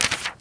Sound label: PAPER; DriverEd; Lessons